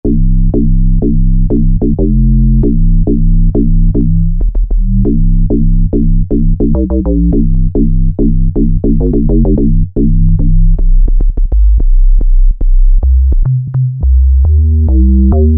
2 Step Bass, Korg microKORG; Factory Voices

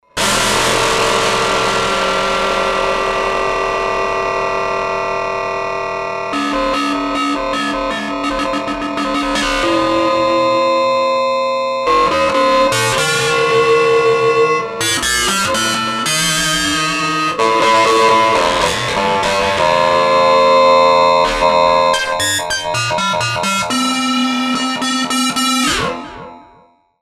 SPACE CONTACT

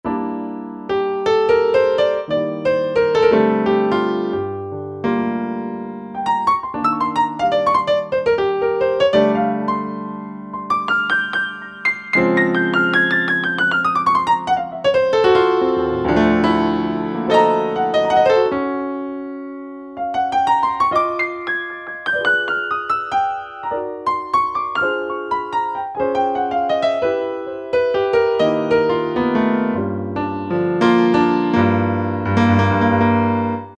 Piano Keyboards Synth; Yamaha A4000

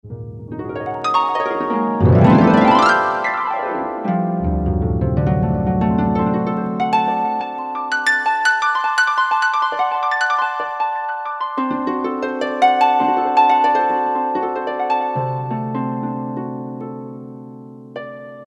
Harp, Korg T3

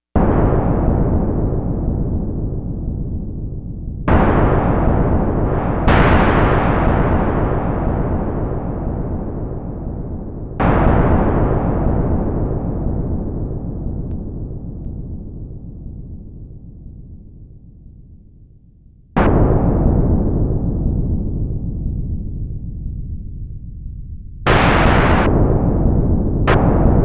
THUNDER Minimoog Sound

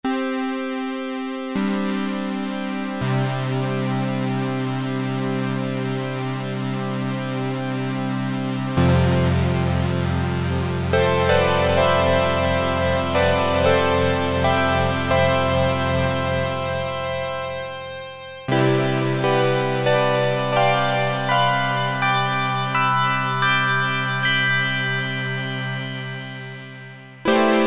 FUZZY FIFTHS, Roland Jupiter-8, Factory patches

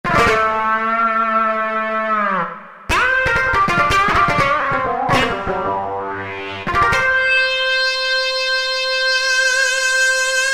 Press Wah Wah, Kurzweil K2000; Kurzweil K2000 V3

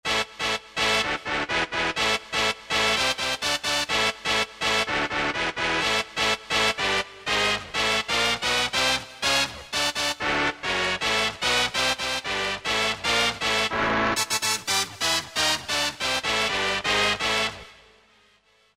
Brass Hit; Roland SRX-05 Supreme Dance

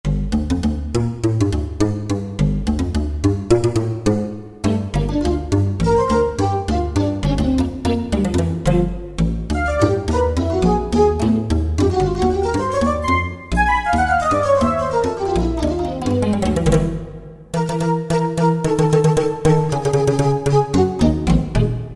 Ethnic Session, Roland D-50; Factory preset demos

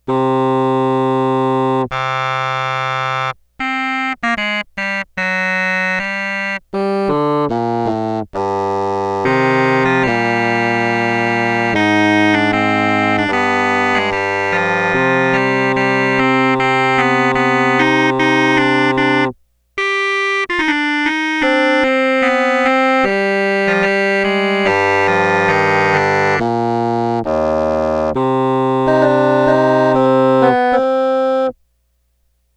SHAWM CRUMHORN

